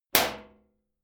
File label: club hit sheet clank medium